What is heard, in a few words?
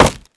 wood; Mafie 1